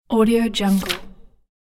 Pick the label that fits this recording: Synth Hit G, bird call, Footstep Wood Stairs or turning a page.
turning a page